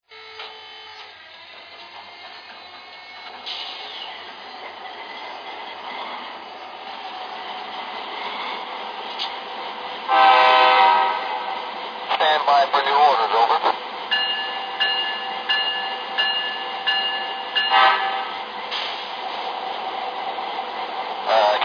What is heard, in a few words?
F3 Dash9; NS; CNW